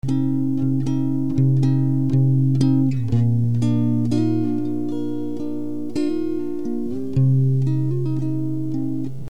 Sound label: Flanger